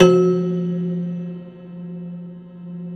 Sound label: Rubbery Piano, prepared piano; Total Piano; Rubbery Piano 2 +ped